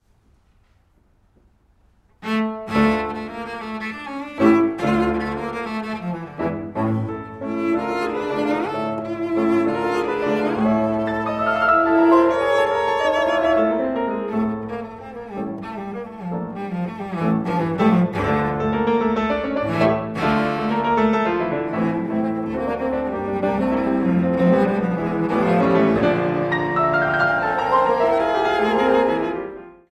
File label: Sonata for Cello and Piano in G Minor II Scherzo LIVE; Chopin; Op65